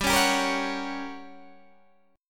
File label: G Minor Major 7th Flat 5th, G, down, Guitar, Open D